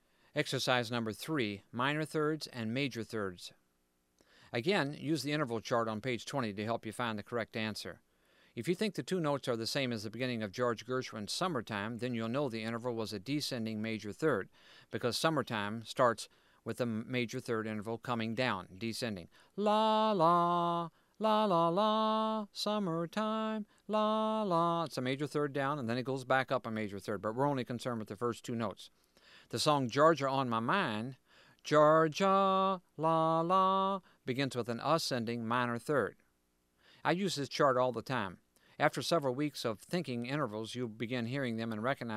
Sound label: Major & Minor 3rd Intervals